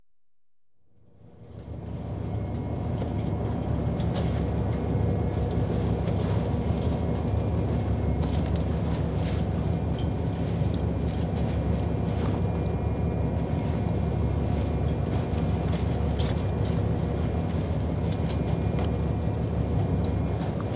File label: Beam Fishing Trawler, Collecting; Ships And Boats; BBC Sound Effects Library; Ships And Boats 1